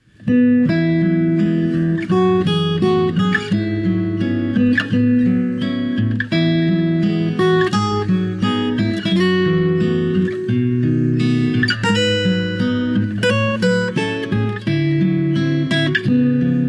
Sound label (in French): berceuse bretonne instrumental